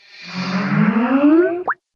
skype sound

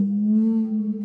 korean tv effect, korean ding sound

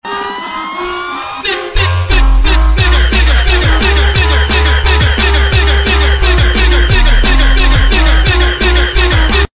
song beat

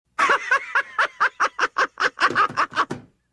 laughing; pingu

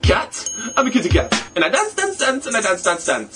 kitty cat song

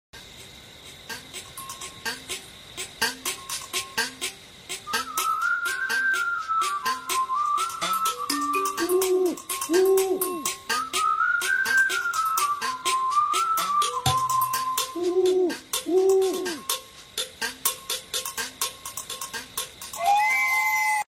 marching owls

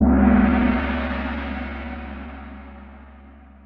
gong

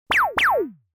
explode

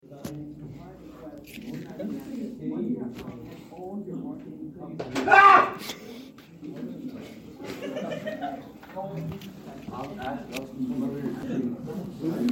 fear scream

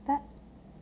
blip

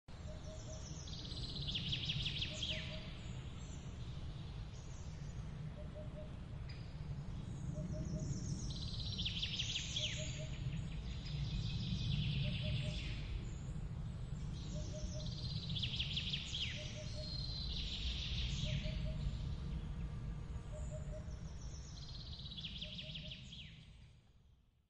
morning ambience

